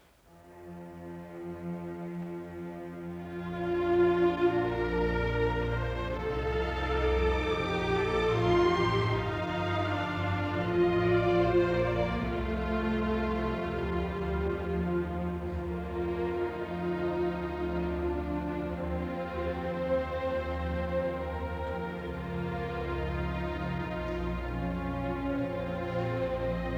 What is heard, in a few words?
Music Example (Orchestra); Deryck Cooke; Illustrated talk, Symphony No.10